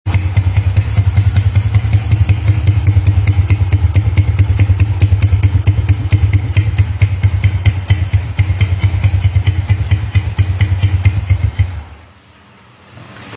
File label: Tizero exhaust